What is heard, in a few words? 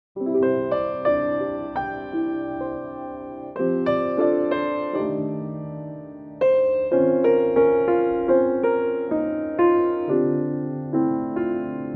piano C; key - C - vocal range - B to D (optional E); Beautiful piano only arrangement